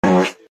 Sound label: fart